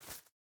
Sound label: footstep barefoot grass jump start; Rust